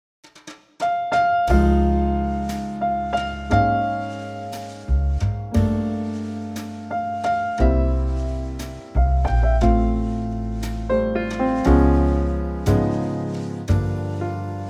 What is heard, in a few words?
F Trio; jazzville